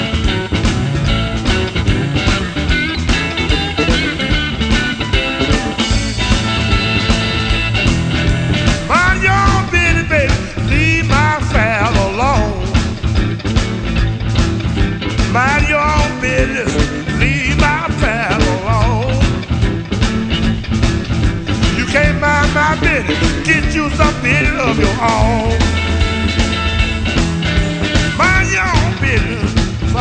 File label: blues